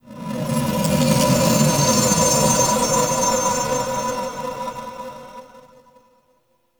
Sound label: Fragment Unlock Message; Warframe; Cephalon